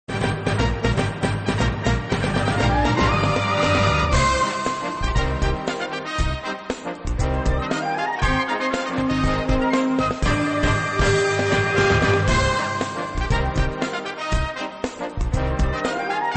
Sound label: Funk